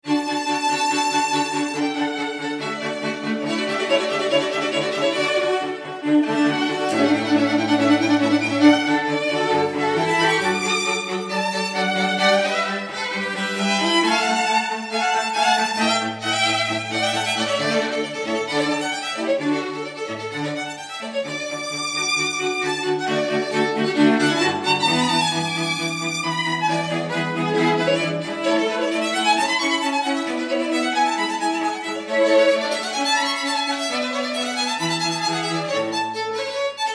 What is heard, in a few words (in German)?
Quartett Klassik Divertimento; rossini quartett; mozart